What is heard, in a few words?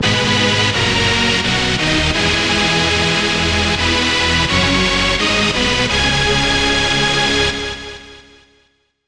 Lead, Vital; Space JoJo; Routed Sound; Vital Expansion - Star Scavenger Vol.1